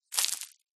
foley dirt very small stones debris handful drop ground; zapsplat